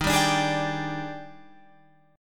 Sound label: Eb Major 11th, Guitar, Open F; EbM11; strummed; JGuitar